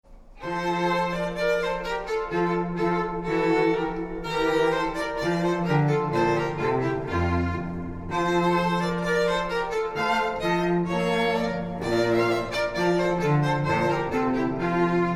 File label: Alleluia, String Trio; Wedding Ceremony Music